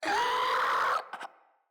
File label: Infested Crawler Hear Sound, enemies; Crawlers